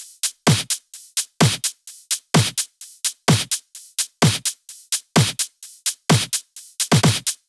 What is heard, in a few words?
Houseloops 128BPM; Vengeance Samples